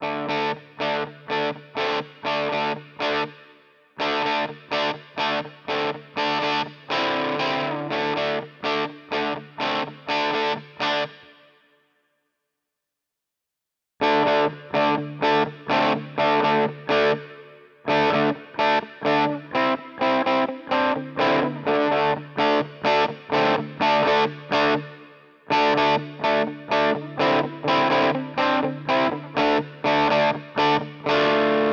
Harley Benton DNAfx GiT Vox MD441 max distance min distance